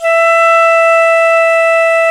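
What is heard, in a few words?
FLUTE PICCOLO 2E; Roland LA; ORCHESTRA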